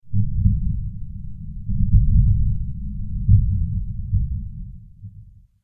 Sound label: creak; rift